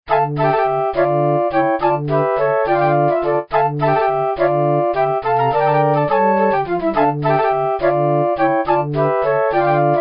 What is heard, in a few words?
Menuet